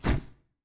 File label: mob formation stack